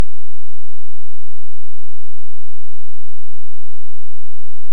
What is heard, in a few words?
Hydrophone; OneOcean